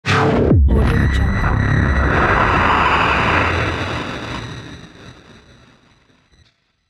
dirty distortion stinger transition

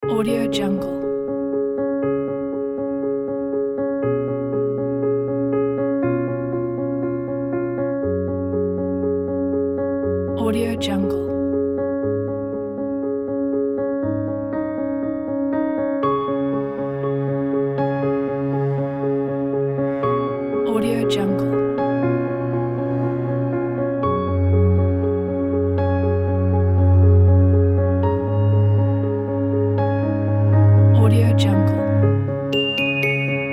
inspiring piano

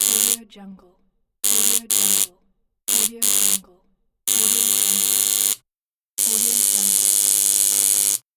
the electricity

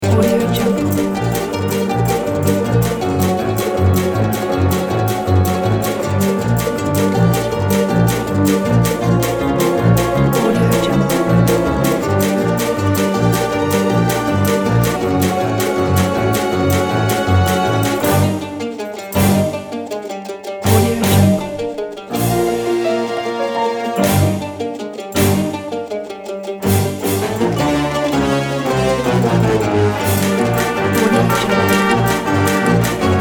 halloween rush; zheninmusic

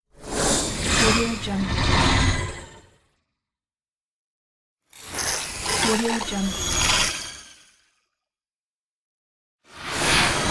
eerie anomaly bursts

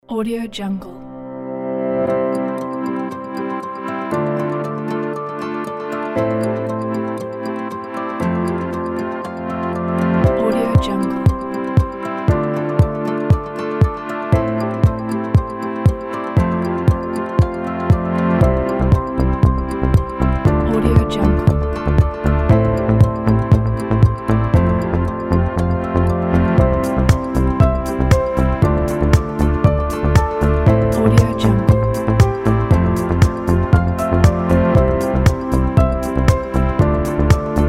corporate inspired

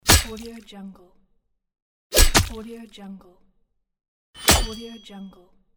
sword hit flesh stab

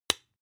object spice jar small open hard; smartsoundfx

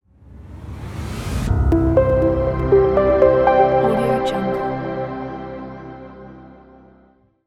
opening logo; gold-point

